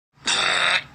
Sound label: Llama Barf